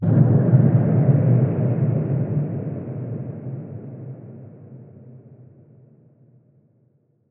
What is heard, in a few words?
Atonal Depths, Psytrance; Ghosthack; Psytrance Visions Volume 2